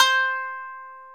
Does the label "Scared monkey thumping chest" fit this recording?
No